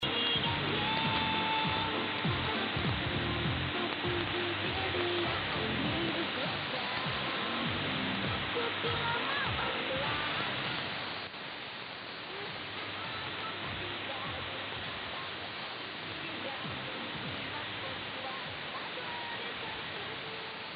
Radio 7280khz; Radio Belarusk

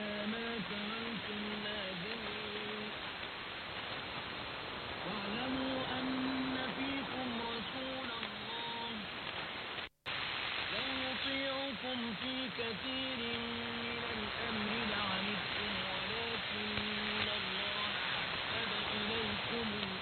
11630KHz AMS Radio; Kuwait; Reuter Pocket

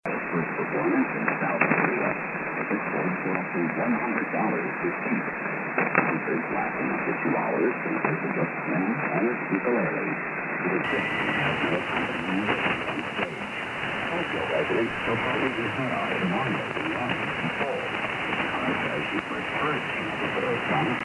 4930khz USB; VOA Botswana

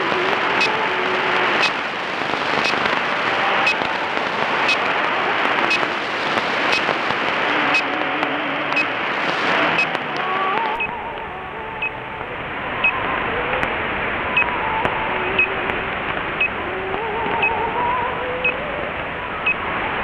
Voice of Korea 13760khz AM

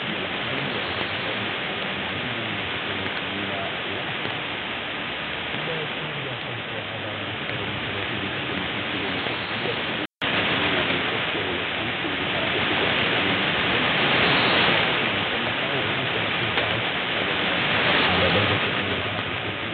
9575khz; Medi 1

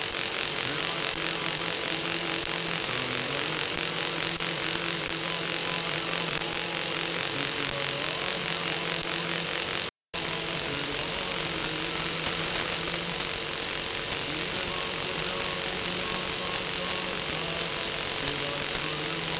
153khz AMS; CloudIQ; Perseus; Redeption was in AM-synchronous and 9KHz band width. Antenna: NTi MegActiv.; Antena Satelor

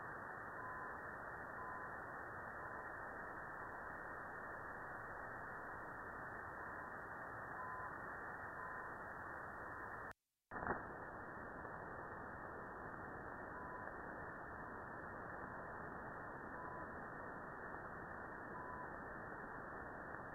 USB CHU Canada

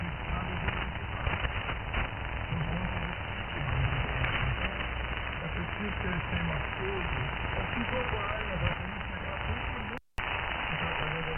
4875KHz AMS; Radiodifusora Roraima

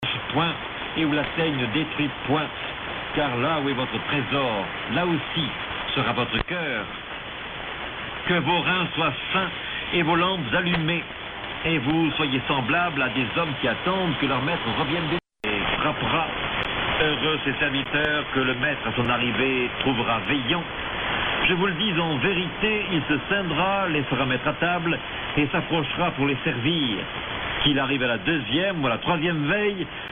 12105khz AM 7khz; WTWW

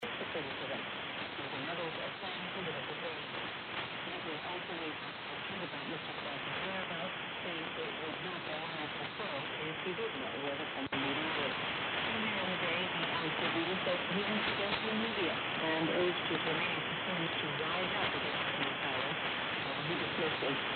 4930khz; VOA Botswana

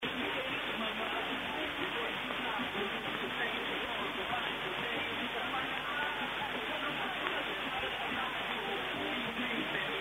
11780khz; Radio da Amazonia

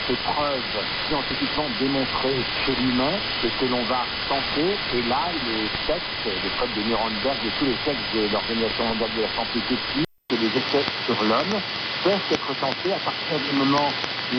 603khz AM; France Info

